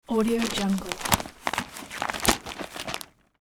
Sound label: sectional newspaper unfold section